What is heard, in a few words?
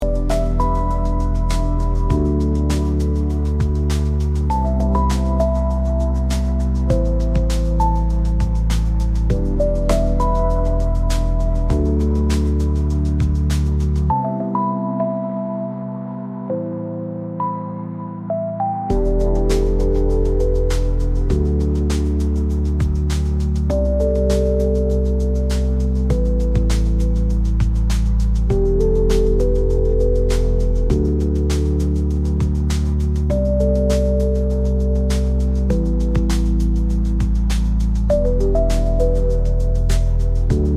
celestial whispers; atmosfernaya